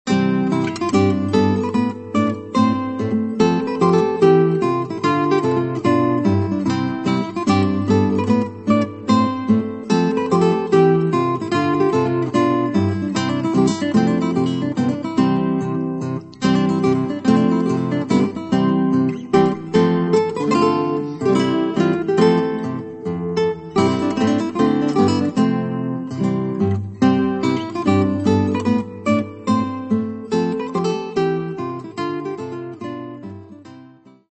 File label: hornpipes